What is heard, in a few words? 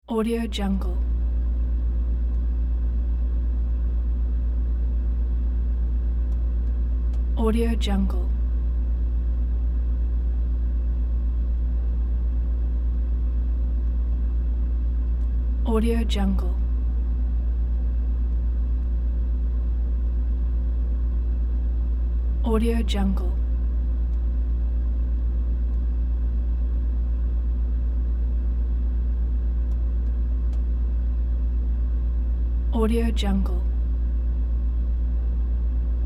refrigerator